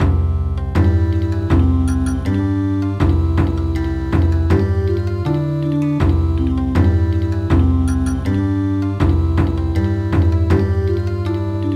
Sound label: Bon Odori